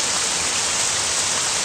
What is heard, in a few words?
Rain; Ruu Goblin Quest; bgs